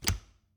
Refridgerator, old fashioned, wooden, steel, brass clasps, hinges, open; Eiravaein Works; Vaeyan IV